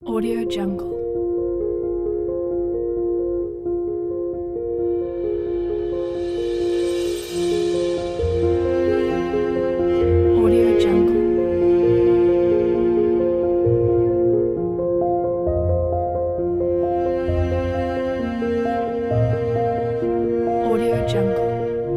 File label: rainy piano kit